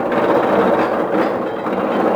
coaster track; Tony Hawk's Project 8; XBOX

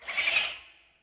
baby cry; Star Wars Obi Wan; XBOX

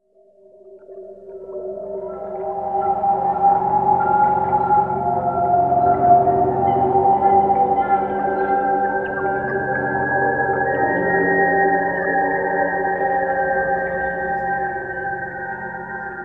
WINDOW; Myst III Exile

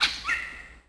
bird Call; theed; Star Wars Obi-Wan; XBOX

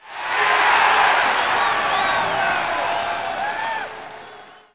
cheer, crowd

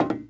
footstep walk steel hollow

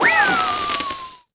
funpark balloon rise; Tony Hawk's Project 8; XBOX

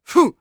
attack voice; UFC Tapout

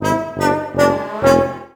Loss

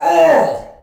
tiki hit; hawaii

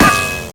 metal solid hit; projectiles; bullethit; Max Payne

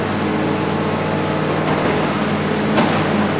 crane; Soultree

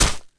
sword hit body; impacts explos

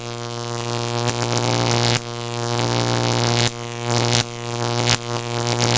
elec; The Elder Scrolls III Morrowind GOTY; XBOX

